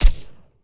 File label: scar silencer; STALZONE